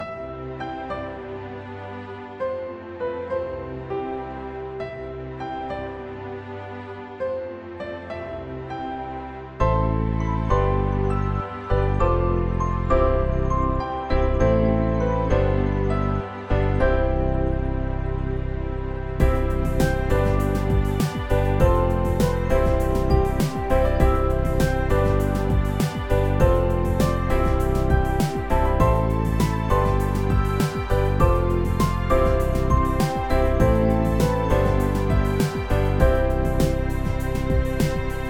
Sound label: Casual Theme Looped; Dual Blade: Battle of the Female Ninja